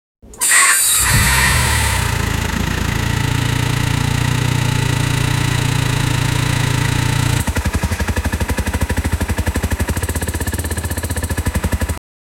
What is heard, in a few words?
honda cbr1000rr sound, motorcycles